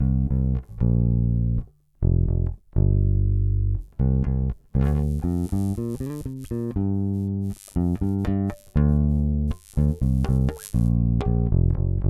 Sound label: bass test; stoergeraeusche wenn man dem pickup nahekommt